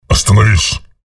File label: pain ability damage; visor; low health